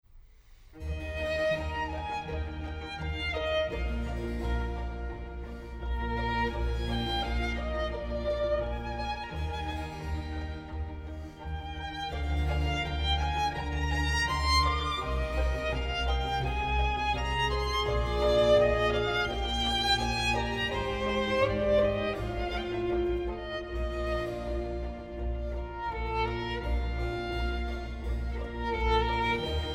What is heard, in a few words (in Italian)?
musica